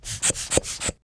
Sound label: air blower